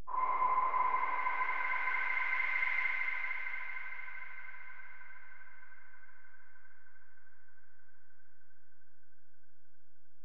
Fx, Alesis DM-PRO Drum Machines

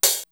OPEN HH, DPM-48 Drums

